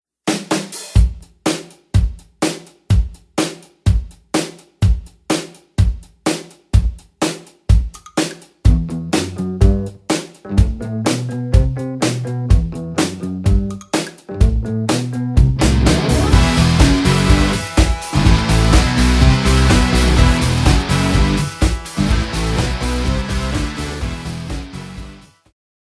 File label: Expensive Drums